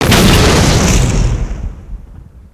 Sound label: ship explosion; destructibles